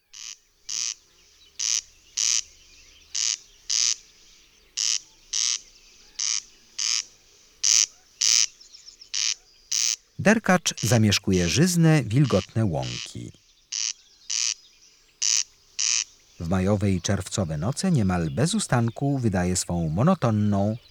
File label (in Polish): Derkacz, Ptaki; Kurs PTTK